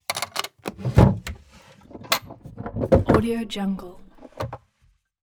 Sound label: drawer wood open